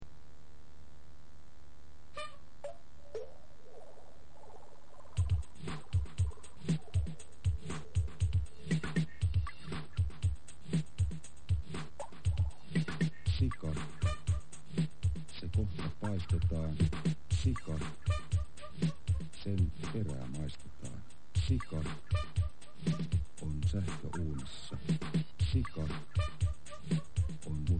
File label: heatbeat; humorous collection